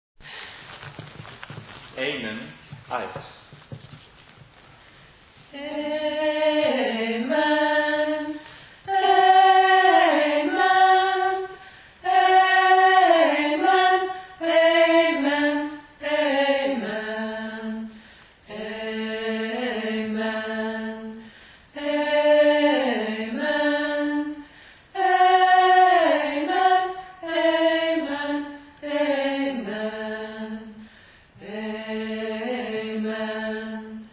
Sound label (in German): Amen Alt